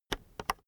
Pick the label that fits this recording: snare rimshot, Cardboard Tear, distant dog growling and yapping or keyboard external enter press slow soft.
keyboard external enter press slow soft